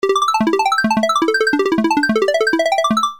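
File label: arp random; nord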